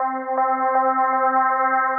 Pad; Cascadian Forte

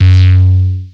Analog Synths F#1; Hottbottom; Foundation; Ableton Foundation FreePacks

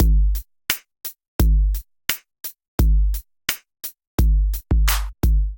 Kit groove 86 bpm; MIDI Clips; Onyx; Ableton; Drum Essentials

